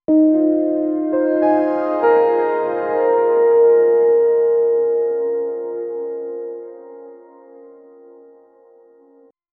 short romantic

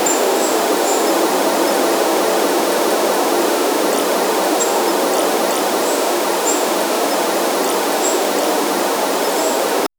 pine forest breeze light

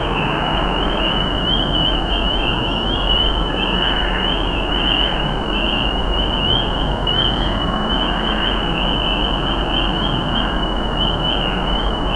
foggy golden gate san fra, afternoon

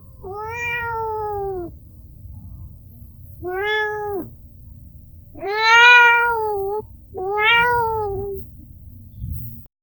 cat meowing sad

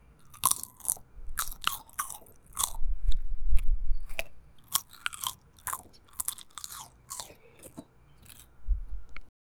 slow chewing something

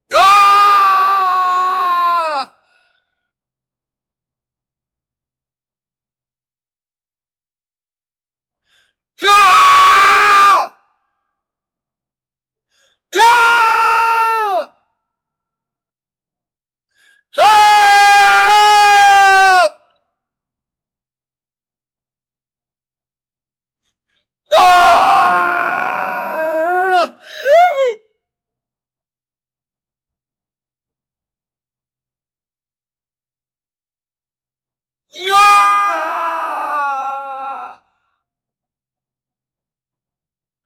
the guy screams in a, call for help

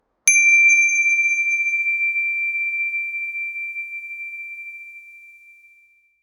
gentle pronounced sea bell

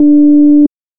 pop tone airy lower pitch

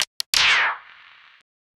juicy gun reload

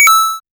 coin sound, 8-bit

